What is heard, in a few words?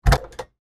Tow Tractor Door, Industry, Tow, Tractor, Door, Open; Pettibone; Tractors; BlastWave